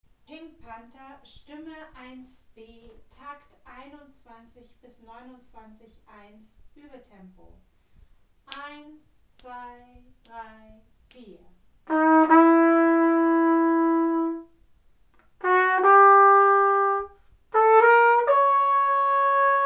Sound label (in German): Stimme 1b Uebetempo, Posaunenchor